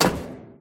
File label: metal grate jump; SFX; PlayerWalk